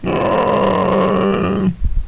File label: zombie die